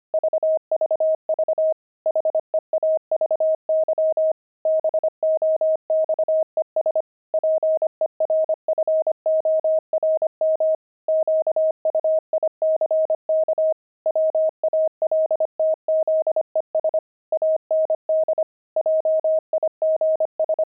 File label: pangram, intermediate pangrams files